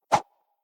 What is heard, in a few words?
Quick Woosh